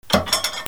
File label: BATHROOM